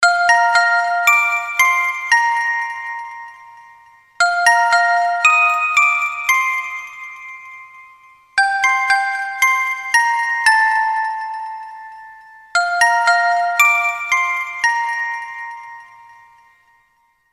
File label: christmas alarm tone ringtone